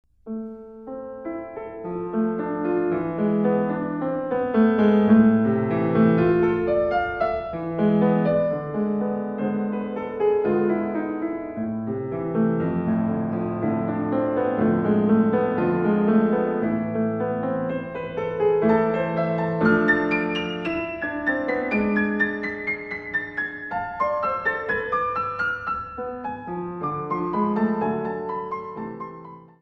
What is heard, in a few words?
piano sonata